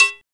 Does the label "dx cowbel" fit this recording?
Yes